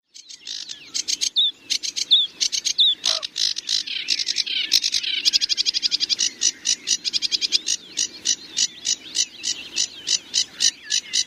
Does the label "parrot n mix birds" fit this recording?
Yes